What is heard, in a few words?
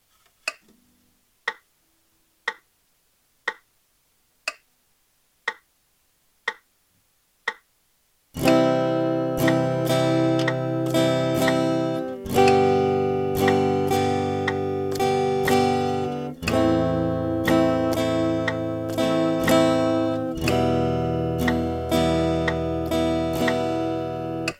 8beat 60